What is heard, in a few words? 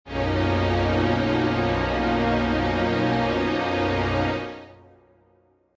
Badnews; The Amber Throne